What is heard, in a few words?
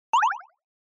Accent